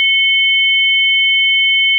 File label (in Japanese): 正弦波（定常）2本, 仮想ピッチ; 音の高さ